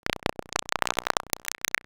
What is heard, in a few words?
glitch high